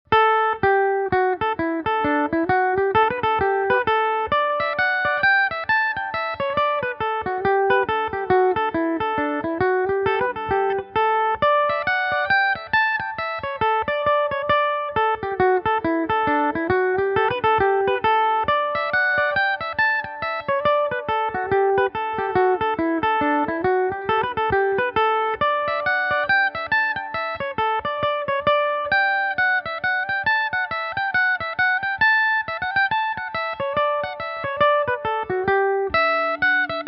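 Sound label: hornpipe D major, Irish mandolin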